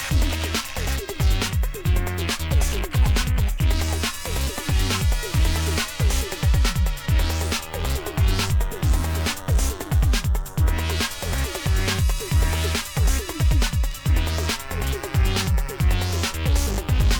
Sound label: Livemix Electro; InterfaceRadio